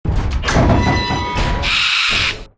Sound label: Giant Kiwi Break And Enter; lethal company